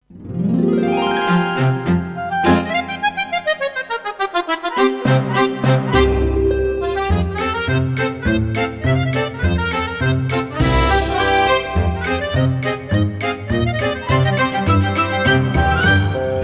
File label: Old Sweet Melody; La Pucelle